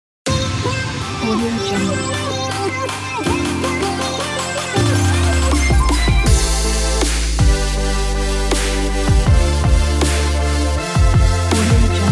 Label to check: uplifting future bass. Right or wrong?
Right